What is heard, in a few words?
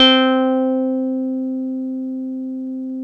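OB8 piano C3, OBERHEIM OB8; Big Fish Audio - First Call Vintage Keyboards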